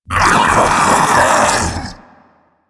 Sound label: Infested Splitter Joined Vocal Alerted; Warframe; Grey Strain